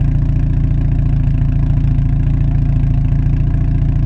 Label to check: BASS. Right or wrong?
Wrong